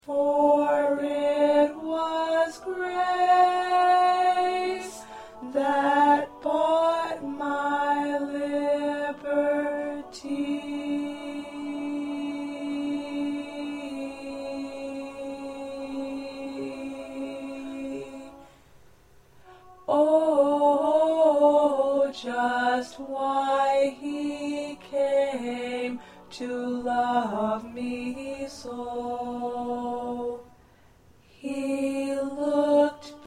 1st Alto

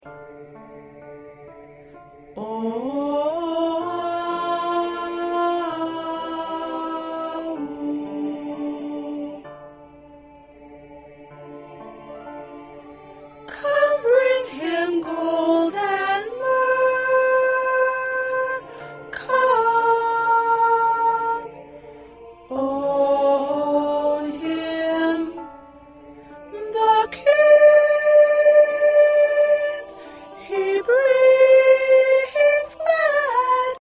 Soprano; What Child Is This

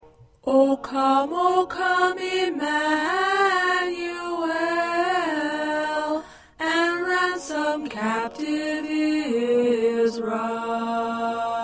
Alto, choir parts

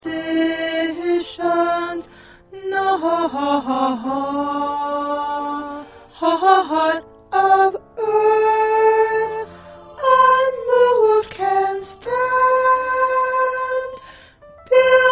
Alto, choir

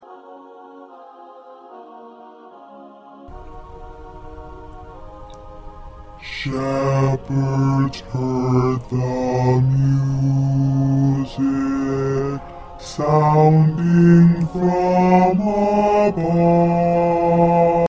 Bass; Bass 1 part preview; christmas songs for choir

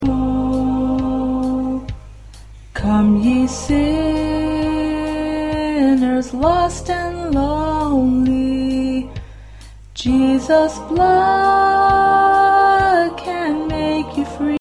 1st Tenor

